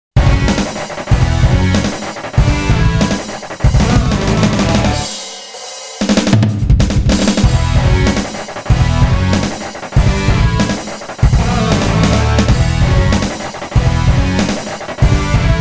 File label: yamaha qy-100 sequencer piece